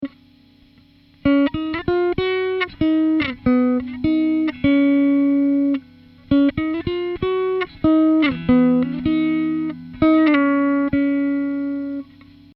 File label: amp Test